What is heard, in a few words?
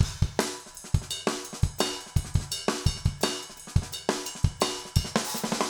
jungle 170